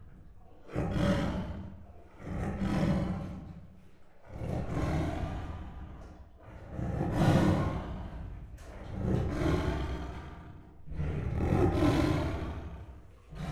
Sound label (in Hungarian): etetes horgoallatok, bengalitigris; miskolci zoo 2009